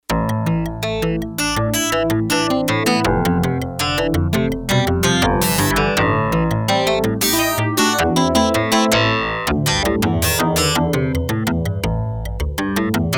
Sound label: Pluk, Yamaha TX802; Factory Patches; Voices